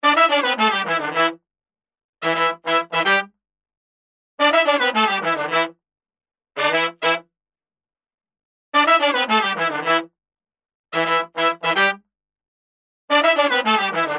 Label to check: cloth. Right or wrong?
Wrong